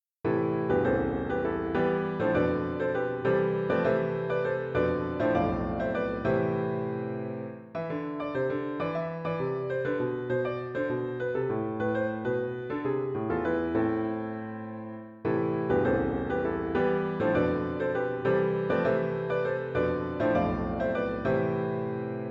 Three Sententiae for Piano

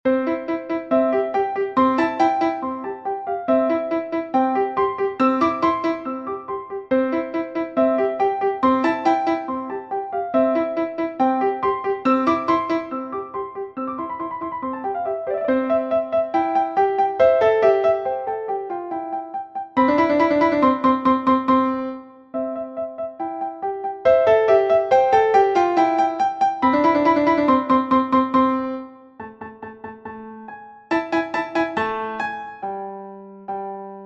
Piano

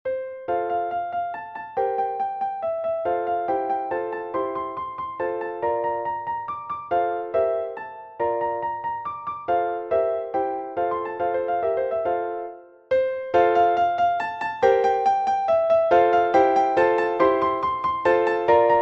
Piano

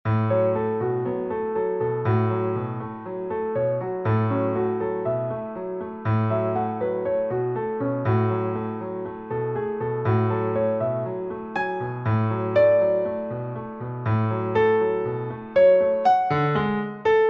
Chimes Original Piano Piece

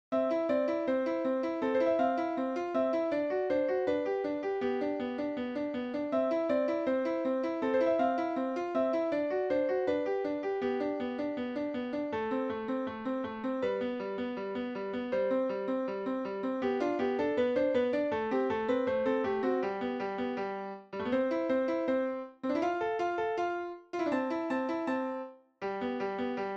Piano

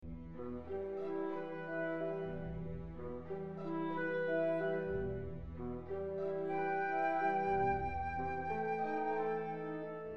Rachmaninoff elegie in Eb minor orchestration

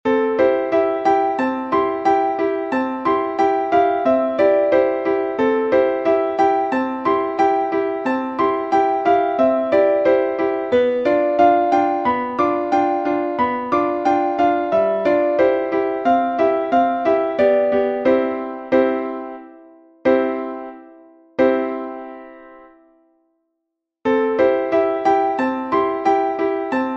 Piano

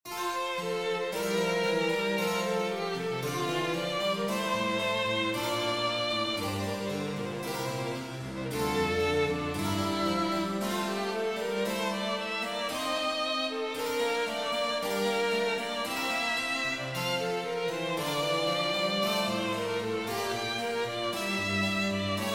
Sonata for Violin

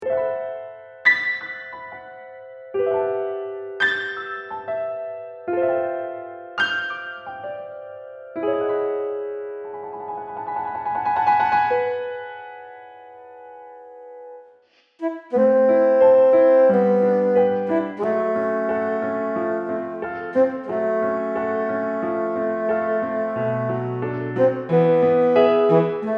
Nostalgia Duet with Bassoon

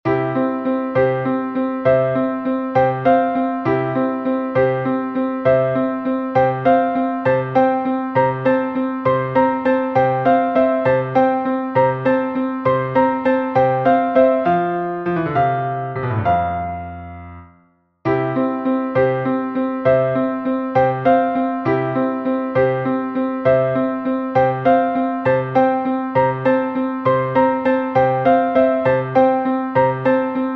Piano score

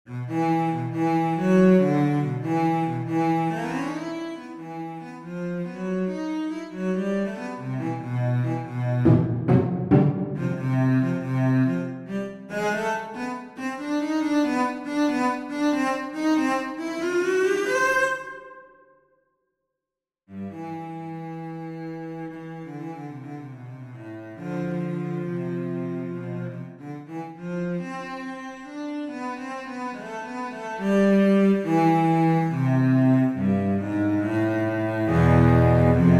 Violioncello

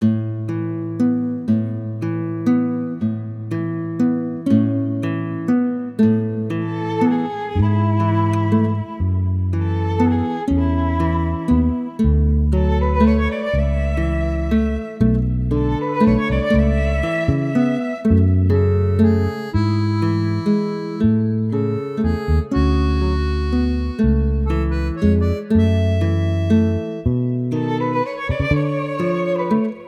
violin

